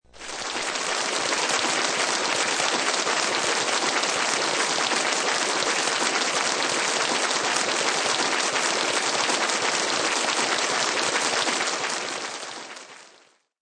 Audience clapping.; Audience Applause SFX; Sound Effects:Bars

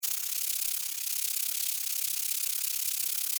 Insect Wings Fly, Steady, Bug; Animal; Wings (Sound Effects:Animals:Insects); BlastWave

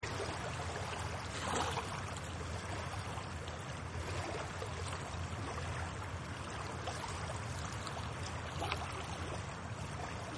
Small Lake Amb, Small Lake Ambience; Lakes; Nature

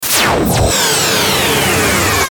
Stinger Zap Distorted Descend; Production Element Imaging Element Accent Transition

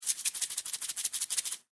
Food Seasoning Bottle Shake Long; Household

